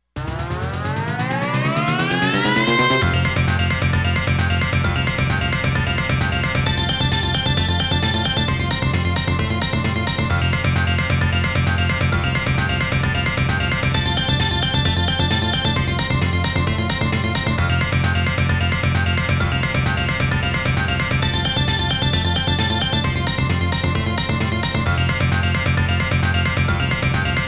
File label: Boss BGM; Aircraft Carrier; Gradius